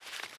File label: grab; transmission; raylib